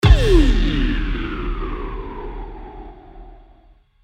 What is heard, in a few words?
Impact; tech house